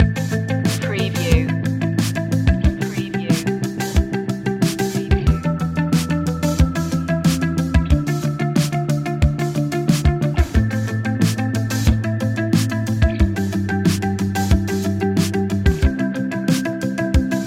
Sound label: analog epic loop